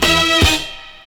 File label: STABS